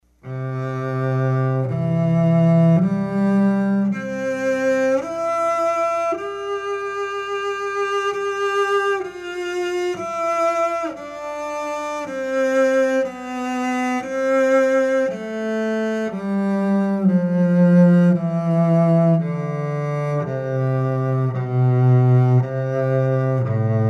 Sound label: acoustic